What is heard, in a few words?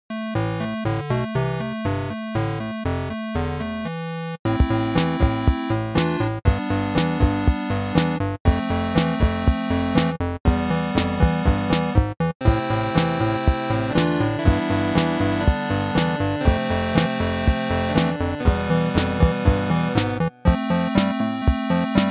game chiptune